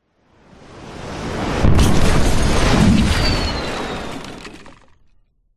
bomb drop jet plane hit explode close sound of plane above short